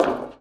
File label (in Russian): korotkij rezkij tochnij udar po derevu